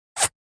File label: Bullet pass by